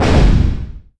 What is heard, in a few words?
metal thump; The Sum of All Fears